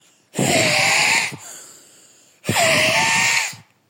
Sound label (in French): asthme terminal